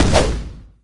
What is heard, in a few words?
ceiling attack no vo, wraith; doom3